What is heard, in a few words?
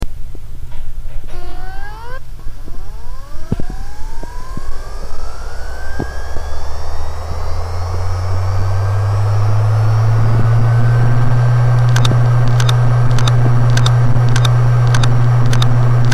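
Samsung dead head, hard drive; Почему у специалиста по восстановлению данных должен быть хороший слух?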